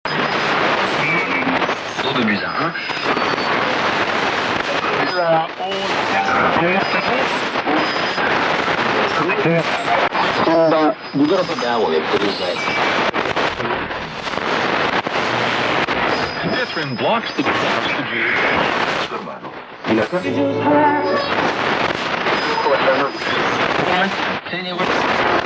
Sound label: Tuning Am Short Wave, static